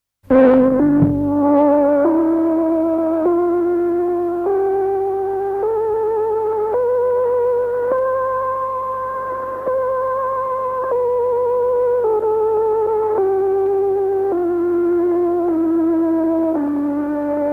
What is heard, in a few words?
8 note rising scale